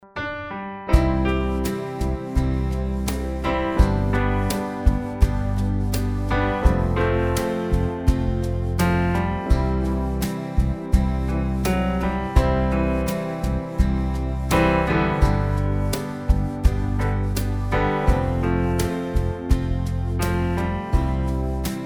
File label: Ketron SD9 Piano Style